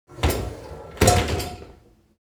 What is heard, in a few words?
object household dishwasher tray top close